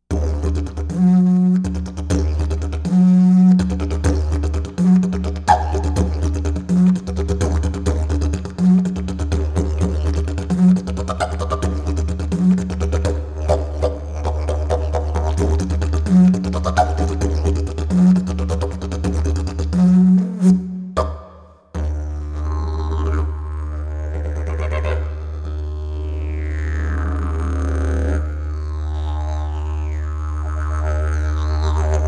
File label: didge